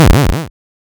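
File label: waveform FM sawtooth sine envelope silence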